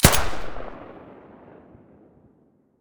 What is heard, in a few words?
silencer ump45 stereo, weapons